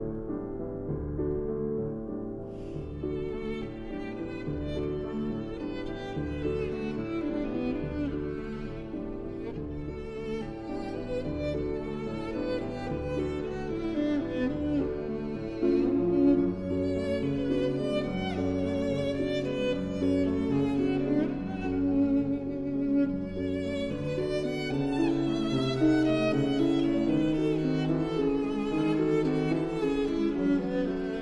Violin and Piano in D; Faure, Gabriel; Berceuse; Opus 16

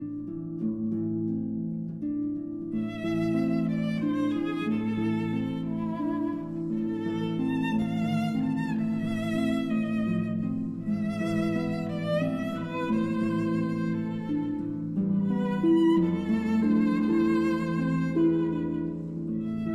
Berceuse, for violin and harp

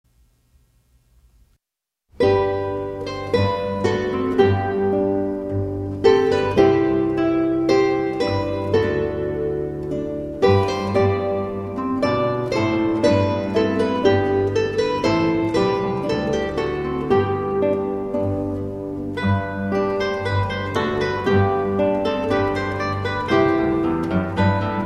Ballet (Guitar Quartet); Praetorius, Michael; Terpsichore

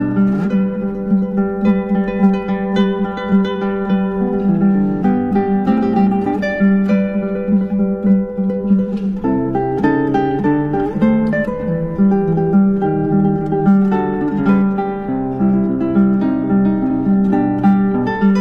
guitar

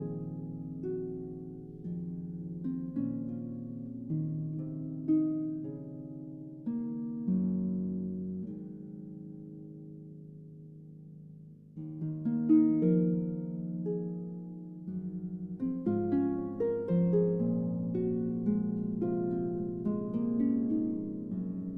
Harp Concerto Lullaby

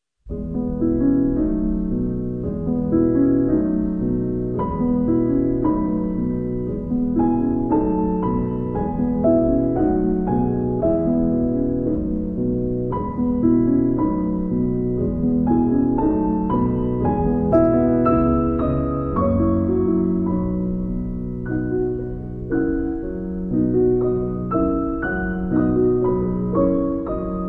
piano, Berceuse

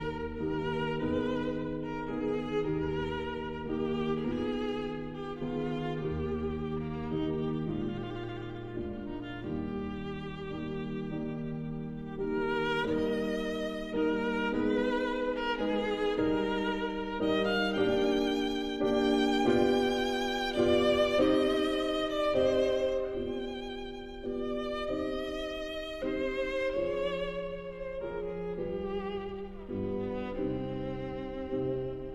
Sicilienne for violin and piano

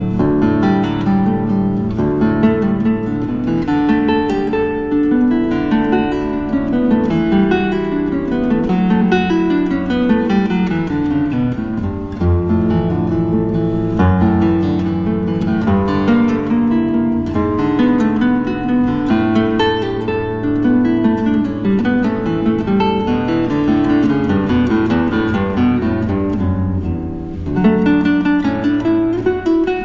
guitar

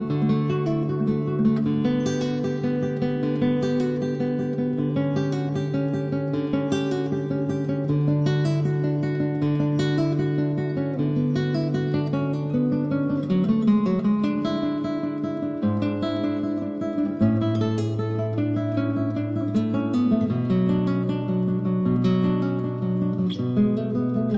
Cello Suite No. 1- Prelude

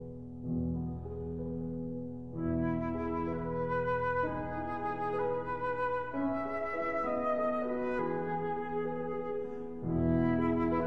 Berceuse (Lullaby) for flute and piano

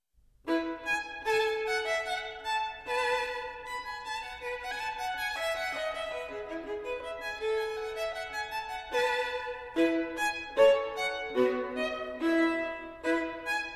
Gavotte en rondeau, Violin Partita No. 3; Bach, Johann Sebastian

